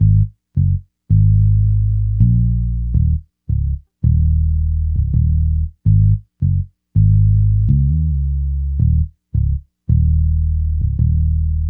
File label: BSE, Hip Hop and R&B Drumloops; Best Service; ProSamples vol.01; AKAI